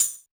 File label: Tambourine, Yamaha DJX 2B